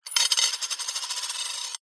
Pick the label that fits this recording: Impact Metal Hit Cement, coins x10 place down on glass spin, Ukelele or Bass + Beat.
coins x10 place down on glass spin